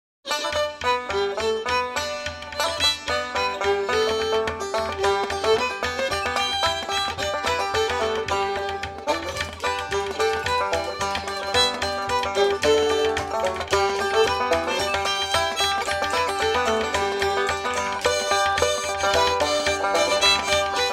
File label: ring ring the banjo